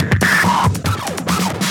Loops Fills; Vengeance Samples

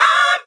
Vocal Oneshots; Vengeance Samples; Vocals

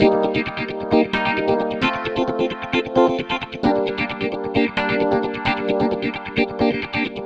Special Sounds Root G 132 BPM; Vengeance Samples; VEH3 Special Sounds

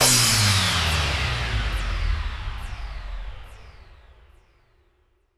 Downlifter

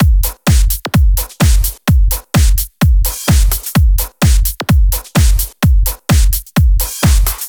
128BPM Drums; Vengeance Samples; Zoo Kit

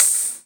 Open Hihats, Cymbals; Vengeance Samples

